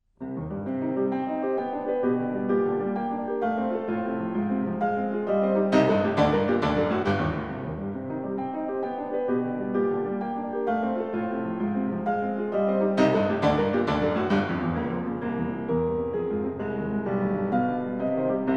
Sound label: Piano Sonata No 13 in E Flat Major; Ludwig van Beethoven; Op 27, No 1; Quasi una fantasia; II Allegro